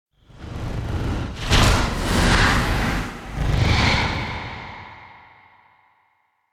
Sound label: Impact Whoosh Whooshed Strikes; Hollywood Edge; sound designer's toolkit v2